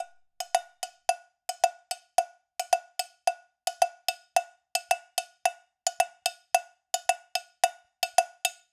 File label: cowbell loop, 110; perc construction loops; SEISMIC Drum Sample Pack; Slate